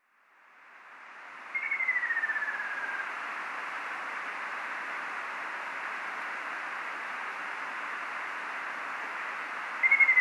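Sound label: Ruddy Kingfisher; akashobin; shiiba; koe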